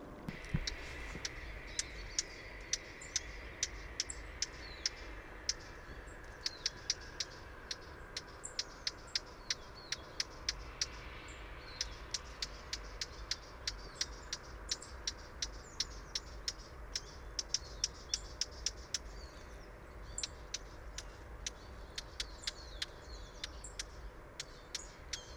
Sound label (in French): Fauvette crie